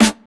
SD, Hip Hop R n B; USB Soundscan vol.19; AKAI

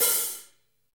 HH HH

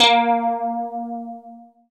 GUI CLEAN, E-Guitar

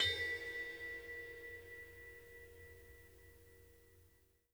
BELL, Drumworks; Sampleheads; New York City Drumworks VOL-1

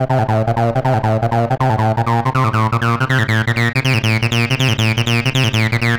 Hardcore Loops Acid Loops, Dance Synths, 160

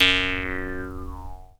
JEWS HARP, Earth Tones; Jew's Harp & Spoons

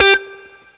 BLU POP, Guitar & Bass

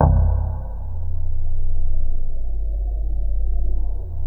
C1, SynthLine; 90 s Sample CDs; NiceBeats; SynthLine VOL-23

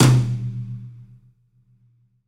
New Brush Flam Tom, Roland BK-5

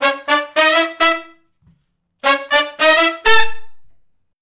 Funk Riff (A) unison, Horncraft for R&B; Sections; Crimson Blue and Fabulous Horncraft 4 RnB

